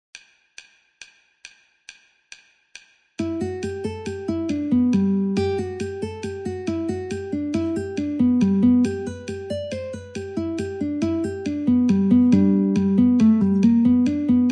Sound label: Guitar metronome 69